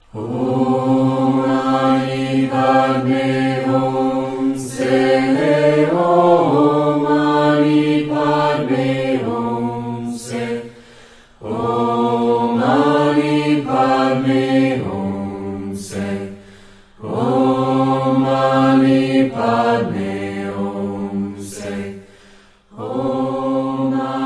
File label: Tibetan sample